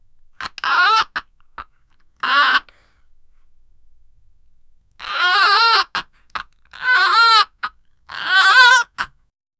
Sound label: puppy, pet